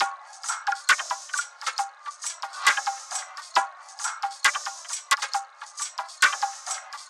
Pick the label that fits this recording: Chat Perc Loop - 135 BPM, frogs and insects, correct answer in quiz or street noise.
Chat Perc Loop - 135 BPM